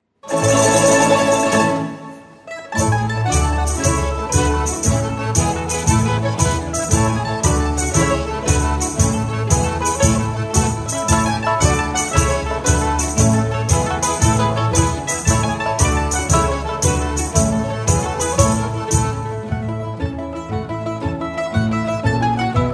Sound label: ceilidh jigs, Zupforchester